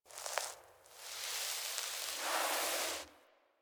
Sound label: Puppy Foley Cub Idle Variant; Warframe; Kubrow